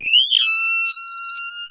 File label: he attack, houndeye